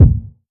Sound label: warm bass drum g key, bass drum single hit tuned to the G note. Loudest frequency: 115Hz; kick drum samples